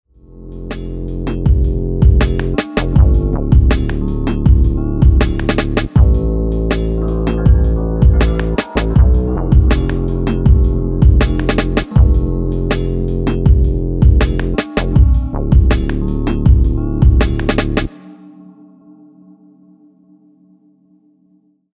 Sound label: wonky dubstep